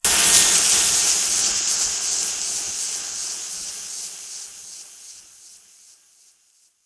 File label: weird; Best Service - XXL Production Effects